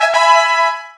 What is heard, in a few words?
FANFARE; lomax